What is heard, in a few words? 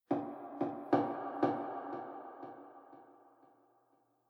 Cave Impact Soft Thuds With Slow Delay; Impact / Explosion; Miscellaneous Impact Sound Effects